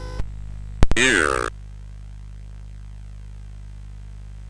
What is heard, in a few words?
sid CSG8580R5; Cave of the Word Wizard Set 4